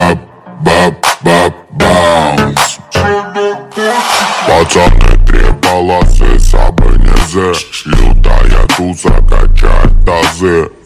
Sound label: bass